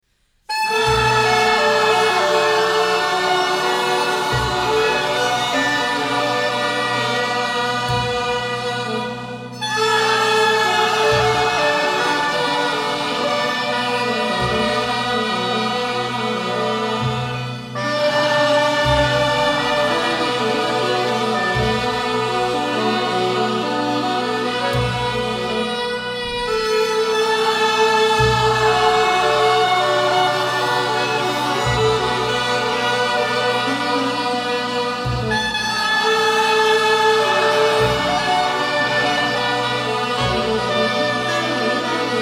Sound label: Blues